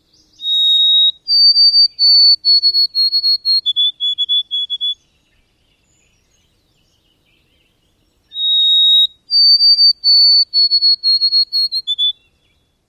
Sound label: songs